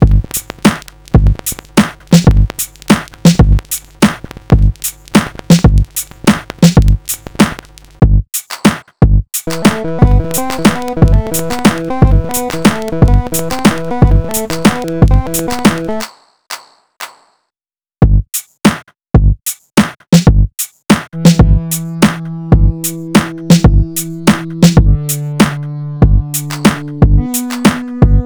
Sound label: break beat